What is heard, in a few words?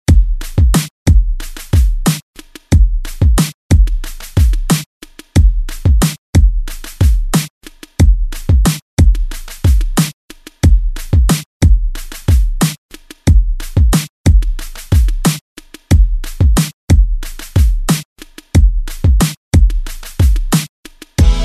80s 91bpm